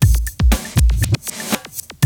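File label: REVERSE LOOP, BREAKBEATS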